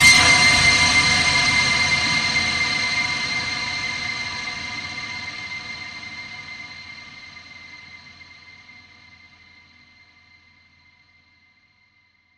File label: Quantum Jeopardy Ping